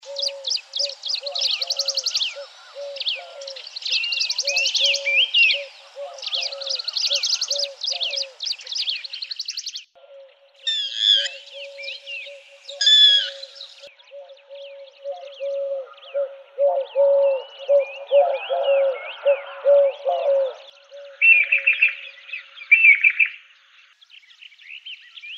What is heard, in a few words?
Birds in grassland and scrubland